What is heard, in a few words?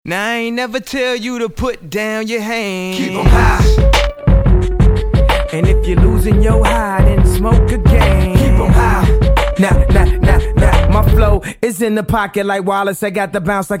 Acapella